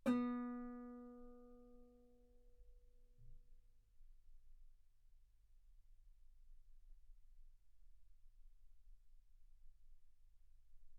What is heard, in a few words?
Guitar B3; ff; sul B